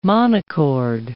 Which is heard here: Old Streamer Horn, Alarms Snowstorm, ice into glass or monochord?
monochord